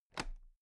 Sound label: toolbox latches open regular